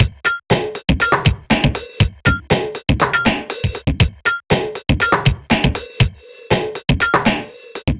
Beats

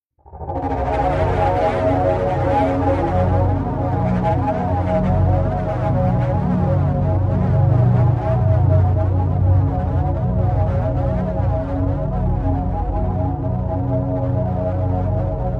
Space Rage Drone

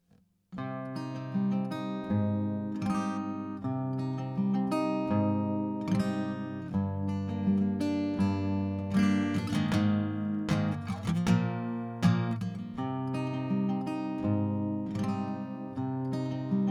acoustic guitar dry; acoustic shades

